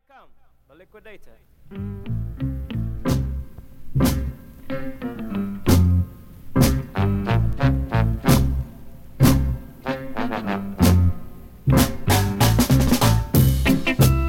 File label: instrumental reggae